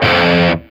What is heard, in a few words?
F#3 SOLDANO ROCK, Guitars; Spectrasonics; Hans Zimmer Guitars VOL-1